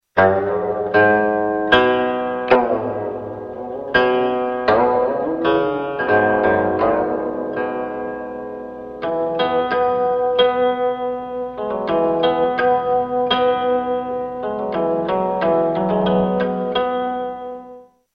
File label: qin; instruments